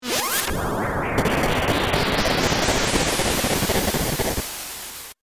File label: long fireball; ff3; ff3us